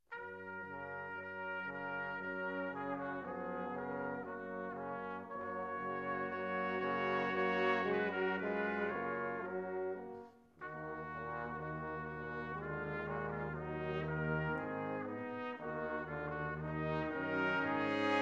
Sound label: brass quintet, adagio; Quintet 1; Ewald